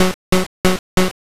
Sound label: chew